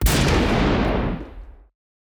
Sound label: Shotgun Fire